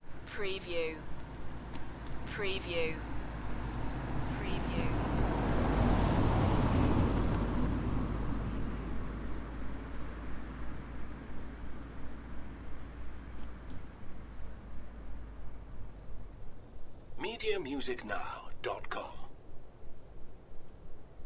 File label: Welsh Forest car park drive by; beanstalk audio